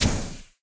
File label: coals damage; Crypt of the NecroDancer